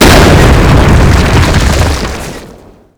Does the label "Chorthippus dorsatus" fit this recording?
No